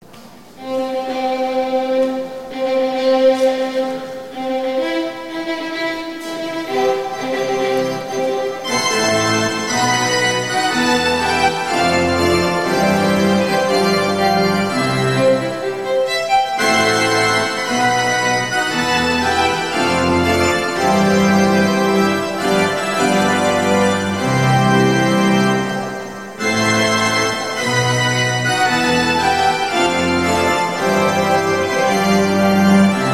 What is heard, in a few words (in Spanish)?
marcha viol